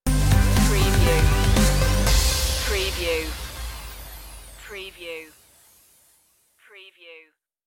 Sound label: vapour stinger